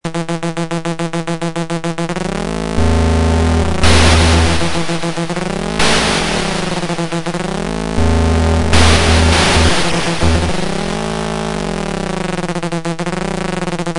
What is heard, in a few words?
Hover Attack